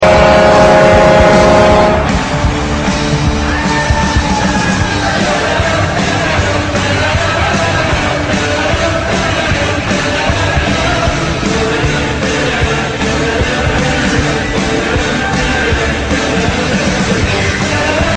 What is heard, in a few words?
Goal Horn; BlackHawks